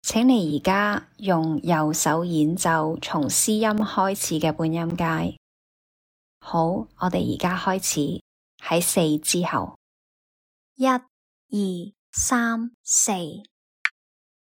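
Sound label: chromatic scale C right hand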